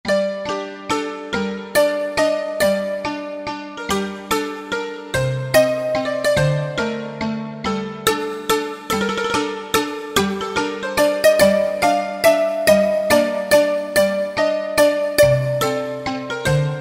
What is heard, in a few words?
V-Dulcimer, Roland V-Synth; Factory Patches